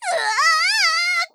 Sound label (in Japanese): うわああああ！, ギャル